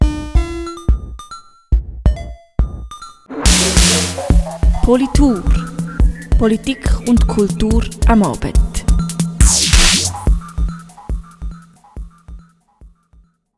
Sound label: Jingle